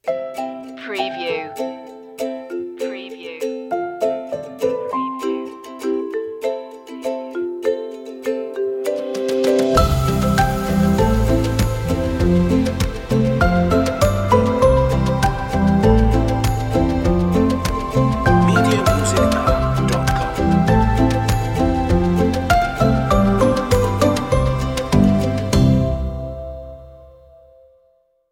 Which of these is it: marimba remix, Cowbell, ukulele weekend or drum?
ukulele weekend